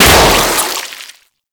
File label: Balloon Pop; Festivities; Warframe; Follie